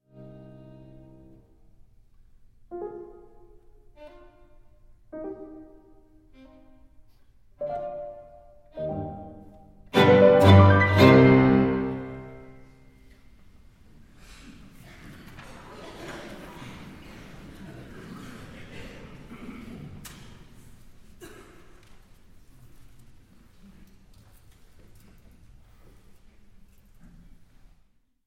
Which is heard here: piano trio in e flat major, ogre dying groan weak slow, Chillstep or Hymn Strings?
piano trio in e flat major